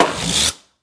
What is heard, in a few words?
Camp Airbag Break